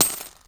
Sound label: coins bag